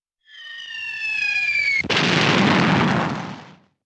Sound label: Bomb, whistling projectile, explosion